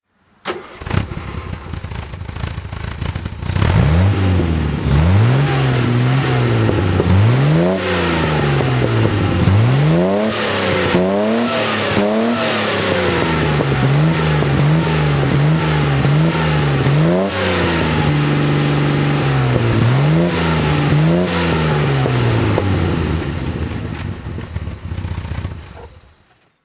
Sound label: healey 100 6 throttle